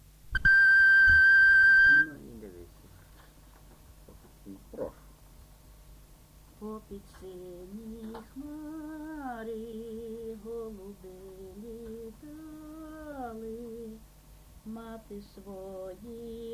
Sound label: popid syni hmary